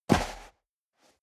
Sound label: step boots sand jump